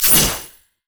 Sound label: lunge; LoL; fiora